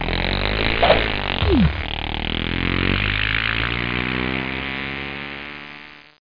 BIPLANE; T.J. Finds a Friend

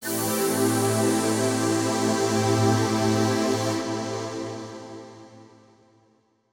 SYNTHPAD, 125; Sphere

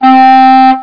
Clarinet; HyperComposer™ DEMO

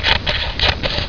Scissors; Mosaic Magic

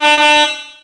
beep beep; urbanchaos

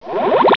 Funky; Bedlam

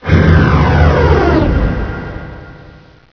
Flame; WARLOCKSNDS

